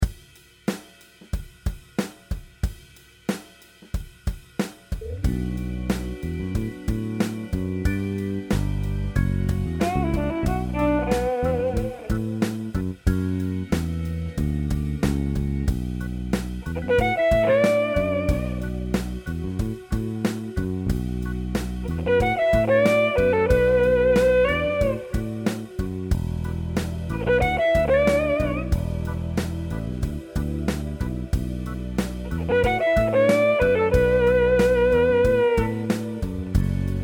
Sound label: Blues; BB Blues